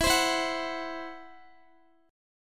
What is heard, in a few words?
E Suspended 2nd Sharp 5th E, Guitar, 4F,4A,5C,5G,5C,6E; strummed; Esus2#5; x,x,16,17,16,14